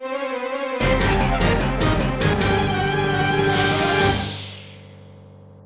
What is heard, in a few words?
Jingle Encount; kateky hitman reborn ds fate of heat hon no sadame ds